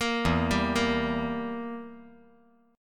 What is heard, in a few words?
E Diminished down